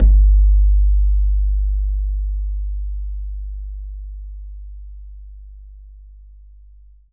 BAYAN, Drums Percussion

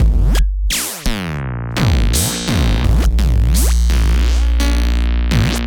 Structured Rhythm, IDM Rhythms

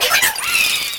Fan Chant; Mario Paint

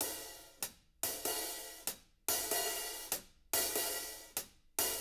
HIHAT LOOPS, Living Drums

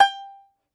Tenor Banjo, Plucked Strings

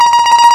BAZOUSTR, PLUCKED; FAIRLIGHT CMI IIX

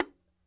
Bongo Click, World Percussion One Shots; Sony CS

